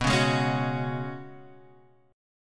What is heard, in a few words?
Gb Suspended 2nd Suspended 4th B